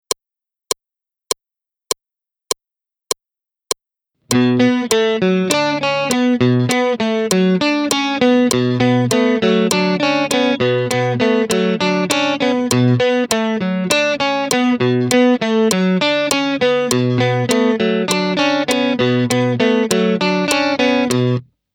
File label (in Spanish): ejemplo 100 bpm, guitar